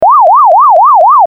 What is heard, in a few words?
Siren